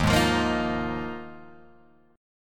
Eb Suspended 2nd Suspended 4th, Guitar, Open G

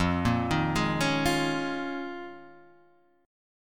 D sharp 7th Suspended 2nd Suspended 4th F, Guitar

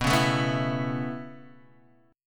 B 7th Suspended 2nd Suspended 4th down, chord

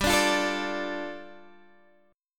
G 7th Suspended 2nd G down Guitar Open G

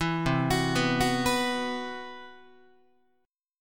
C Major 7th Flat 5th, Guitar, chord; arpeggiated; CM7b5; 7,8,9,9,10,10

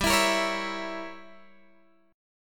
G Diminished 7th, Guitar, Drop D

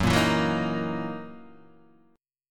Gb 13th Gb down Guitar Half Step Down

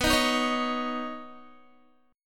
B Major Add 9th B Guitar; strummed; 18,18,15,18,15,x

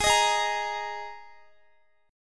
Ab Suspended 2nd Guitar; strummed; Absus2; x,x,18,20,20,17

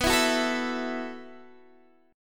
B 7th Suspended 4th B down Guitar Open D

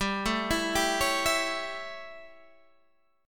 A 7th G, chord

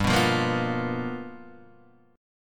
G 7th Sharp 9th G, chord; G7#9 Chord {3 2 3 3 0 1} strummed

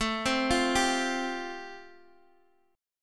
A Minor 7th Sharp 5th A Guitar DADGAD, chord; Am7#5; arpeggiated; chordshape; x,x,7,5,8,5

